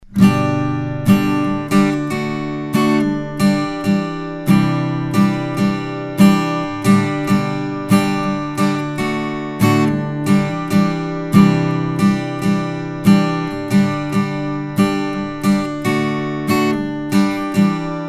Acoustic Guitar MA201; mic shootout

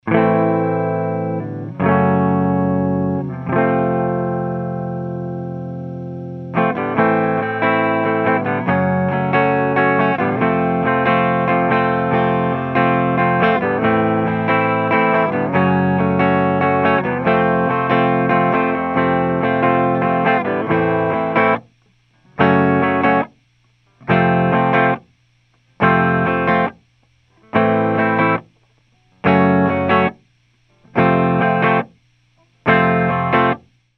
AMP Clean Oceanus, clean eguitar

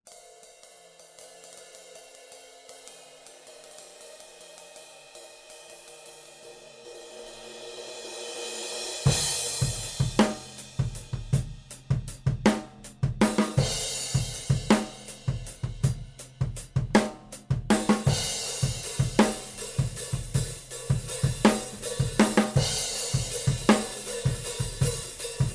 Drum Overheads RN17; SE Electronics RN17

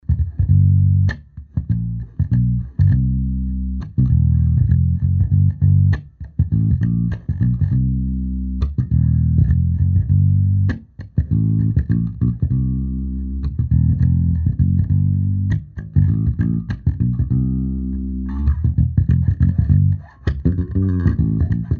AMP Bass 421

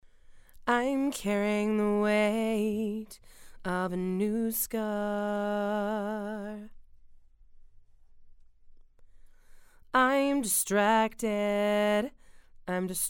Female Vocals; Clarion

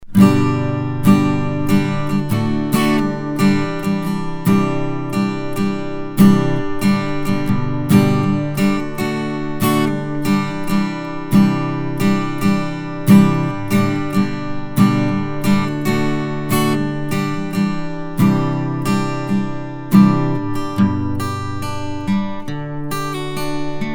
Acoustic Guitar, FET Condenser; mic shootout

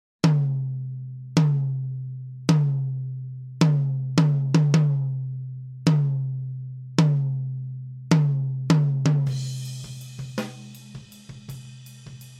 Tom Microphone I5

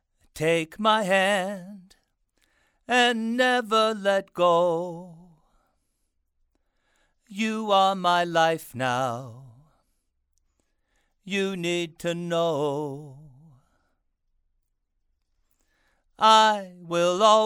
Male Vocals

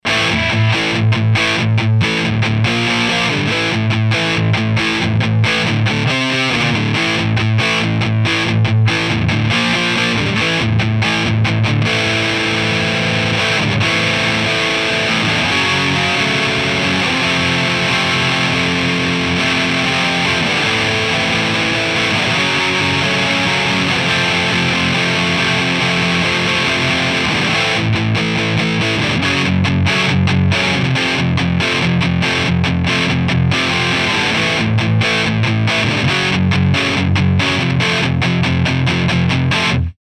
AMP DIst Gemini 5 Tube

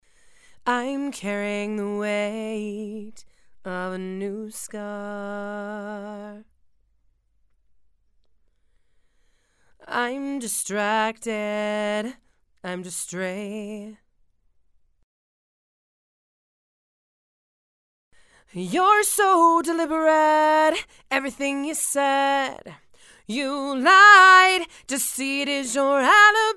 Female Vocals; Gemini II

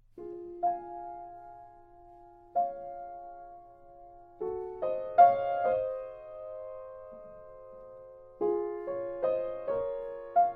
Piano 2200 T

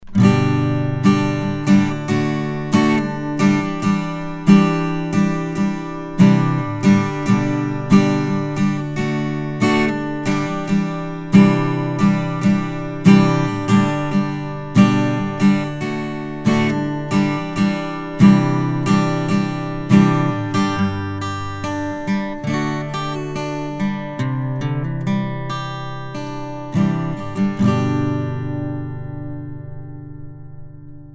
Acoustic Guitar; Audix CX212B; FET Condenser

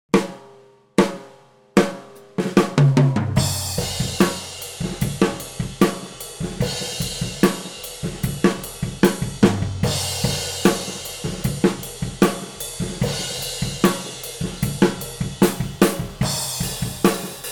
overheads; SCX1C

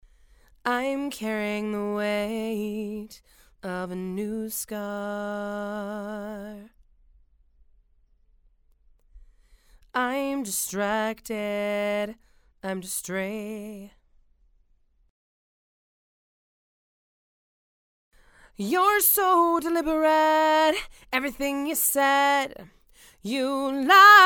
Female Vocals

